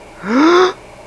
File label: fright; People; Sound Library 2000; Wayzata Technology